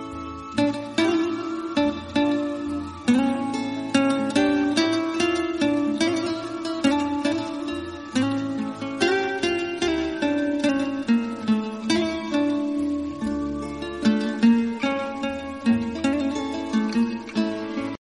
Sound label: soothing guitar ringtone